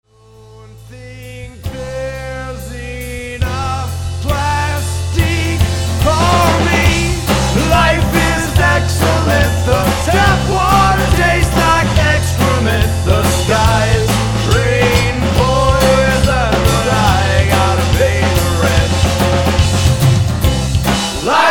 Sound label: bluegrass